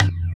EQ Perc, Percussion Mix

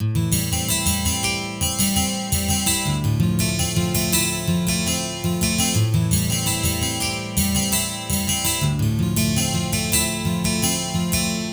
12 String Guitar; Sonic Foundry (Sony Creative Software); Mac Money; Guitars

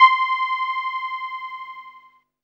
SIEL, String Machines

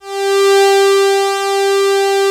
Memory Moog brass 1 G4; Greytsounds VOL-1

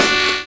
HIP SAX; Univers Sons

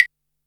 Boss DR-660 Perc, Drum Machines

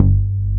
MOOG SOFT BASS; Pro-Rec - Synthsonic; BASSES

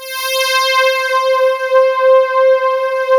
PULSATN, PULSE; PULSENSE

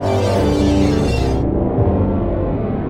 Alien Ho-Down, Guitartronics; Sonic Foundry (Sony Creative Software); Ma Ja Le Ethereal Textures

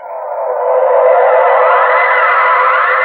Ancient Noise FX, Industrial

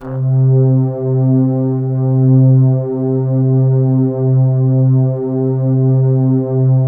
PAD C3 L, Jungle; USB Soundscan vol.05 - Explosive Jungle; AKAI

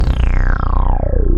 Dance Mega Synth; Best Service; 90 s Sample CDs

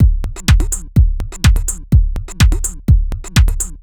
C# 125bpm, House Drumloops; 90 s Sample CDs; Zero-G House Fabrik Drumloops; ZG Drumloops

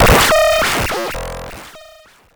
Cwejman S1 - Broke Sn

